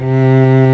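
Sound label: SOLO STRING C2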